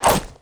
spanner swing; weapons

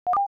team talk

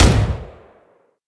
assault cannon fire; weapons

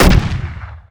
rpg fire; weapons

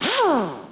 airwrench; push

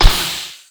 jumpgun fire; weapons

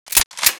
super shotgun cock; weapons

